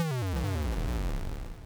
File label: Snake Die